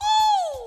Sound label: oooooohhhh